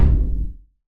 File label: heartbeat; [TRY] We Kicked A Kid (United)